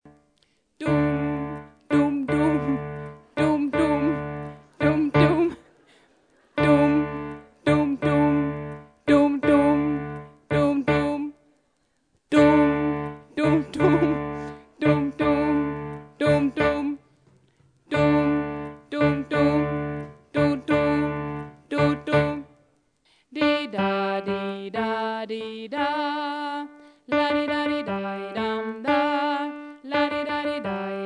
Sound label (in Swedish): bas